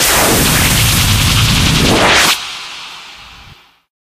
dissolve; video games; source engine; tf

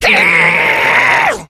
become enraged, infected; npc; alert; Left 4 Dead 2; Source Engine

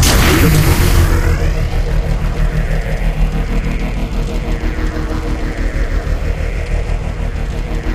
platform start

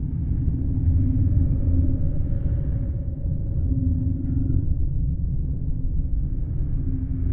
vista tone; video games; ambient; Source Engine; tf; lair

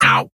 tiny bot take damage; source engine; tf; bot worker

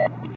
dog idle, npc; Source Engine; video game

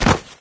flesh impact hard, physics; Source Engine; video game

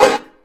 banjo music stop; video games; player; taunt; bumpkins; source engine; tf

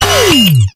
base alarm

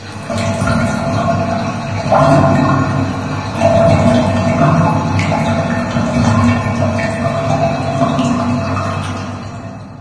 ambient water drain; video games; nature; source engine; portal2

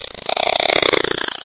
warning, headcrab poison; Source Engine; video game; npc

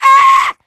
pain, voice; video game; source engine; left 4 dead 2; spitter

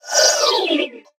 robot synth; Source Engine; Portal 2; robot parts

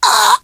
choke, survivor voice; source engine; left 4 dead 2; manager

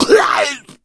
hunter pounce cancel, voice

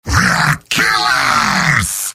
cheers; heavy; video games; source engine; tf; mvm

